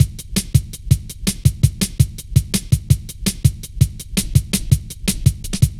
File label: light stepz, drum loops; Zero-G Jungle Warfare 1, 2 & 3